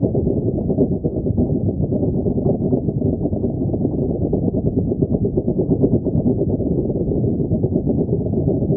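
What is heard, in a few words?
Acid Puddle; Kislotnaya Luja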